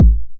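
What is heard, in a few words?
909 BD tape, kick drum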